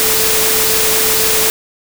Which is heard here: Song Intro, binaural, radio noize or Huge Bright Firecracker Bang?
binaural